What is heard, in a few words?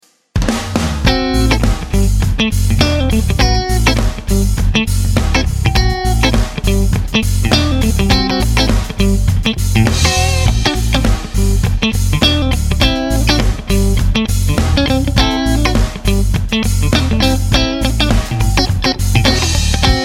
Clean Funk